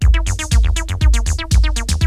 LOOPS, TECHNO; Syntec; Wall of Sounds; TECHNO-BOX

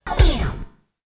Record Stopper (Record Stop); Sonic Foundry (Sony Creative Software) - Stylus Pressure Urban Grooves on Digital Wax

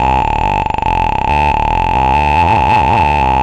Acid Bass

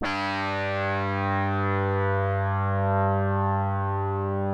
P5 WOW G2, PROPHET 5; Greytsounds VOL-1

